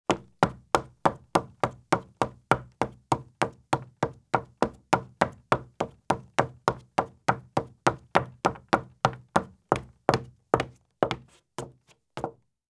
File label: Women's Heavy High Heel Shoes Run on Wood Porch or Footbridge; Sound Ideas; Footstep Sound Effects